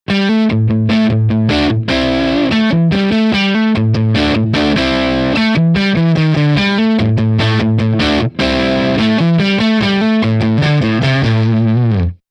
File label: top boost tone